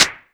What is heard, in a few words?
Clap; TRX